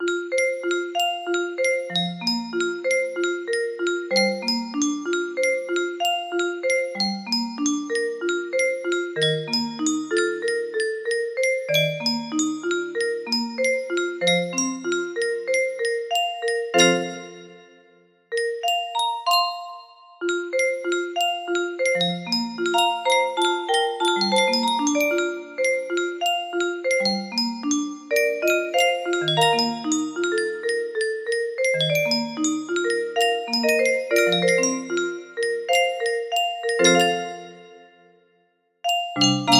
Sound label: music box melody